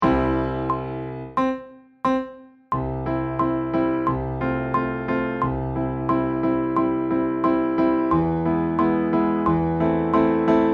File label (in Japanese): コンコーネ17 piano